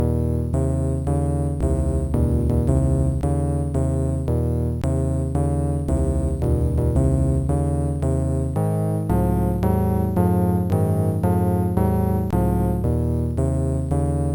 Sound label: boogie; boogie complete 2; Sonix Music Driver